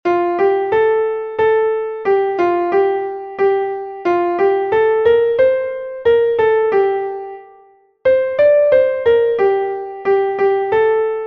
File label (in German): klavier; an der saale hellem strande; melodiemeister